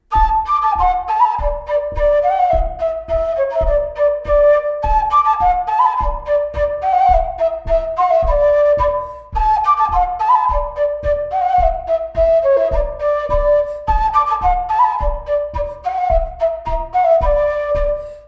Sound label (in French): Bourree